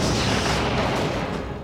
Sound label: Taking Fire; Defiant; trek